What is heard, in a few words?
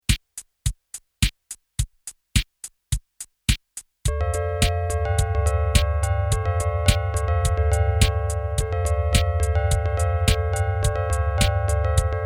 bells fail